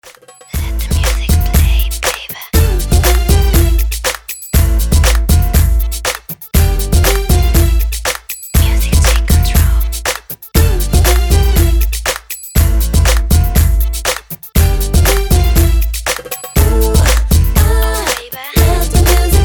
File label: instrumental mix